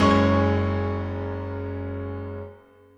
piano end; osu

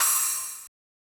crash

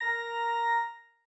organ

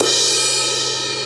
Crash high

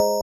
BELL

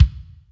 kick

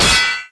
Sword Impact

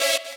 saw

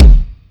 drum hit normal

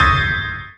piano hit

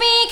vocal

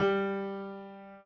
piano intro

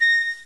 pipe

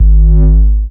Bass Line; osu

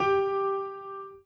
Piano Chord

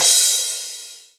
crash loud

